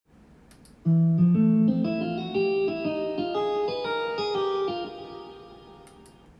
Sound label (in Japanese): jazz fure zu